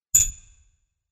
UI moving option to one anoter

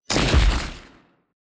BOW hits

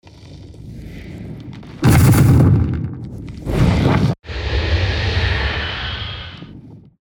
Fire element spell

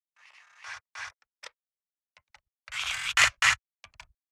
ROBOT SMALL

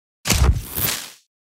Thunder element

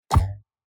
unleash arrow

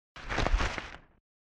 Rolling

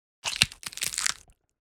bite

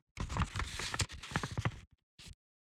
MOVMENTS paper

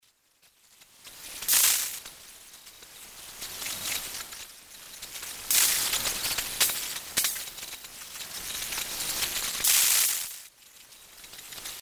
SHORT CIRCUIT

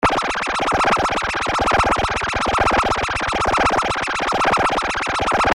beep

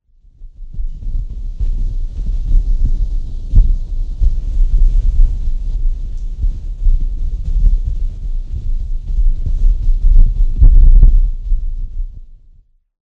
TORCH NO WIND

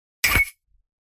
UI X axis

